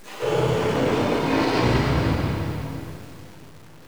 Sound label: amb